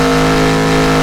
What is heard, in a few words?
HIGH, Engine; Cars